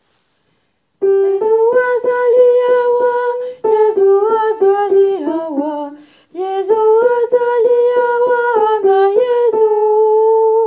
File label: sopran